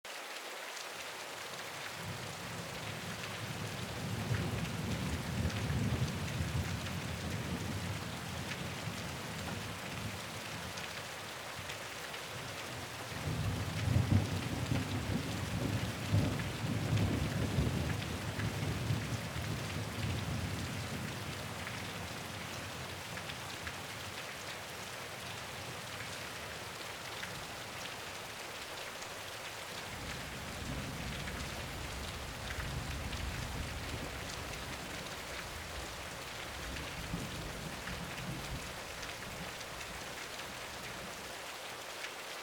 thunderstorm

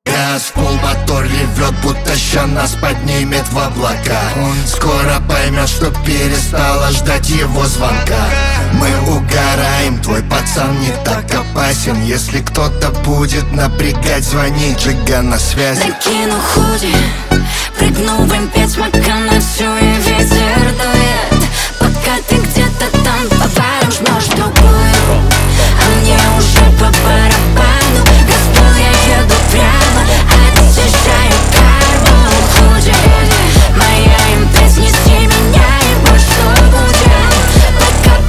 brazilian phonk; russian

